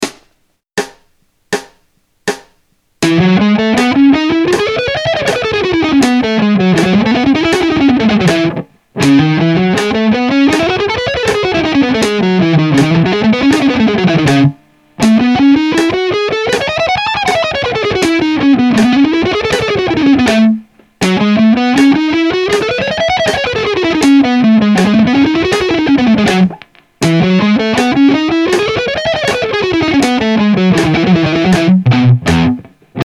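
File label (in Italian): Semicrome Biscrome, rock guitar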